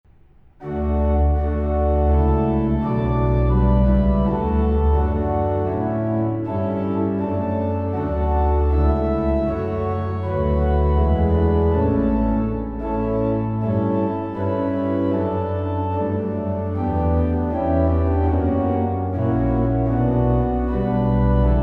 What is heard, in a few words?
organ, hymns; aberdeen